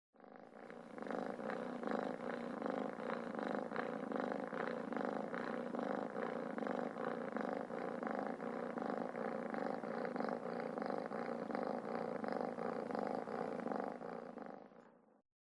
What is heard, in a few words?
DOMESTIC PURR CONTINUOUS RAPID; wildlife sounds